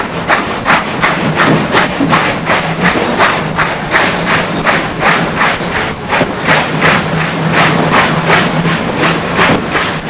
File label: Motor, Train